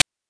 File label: PITCHED NOISE; NES DPCM; MIDINES